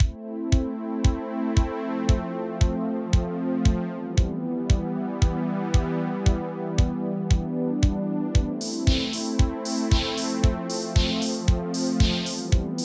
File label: Moody - 115 bpm, Old Skool House; Big Fish Audio; OSH Construction Kits